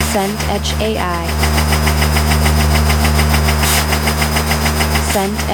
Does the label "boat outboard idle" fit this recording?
Yes